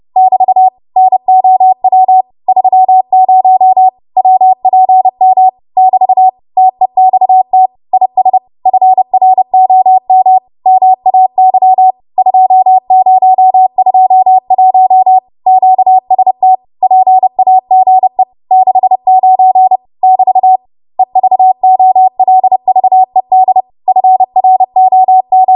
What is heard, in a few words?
20 WPM, Morse; 30 WPM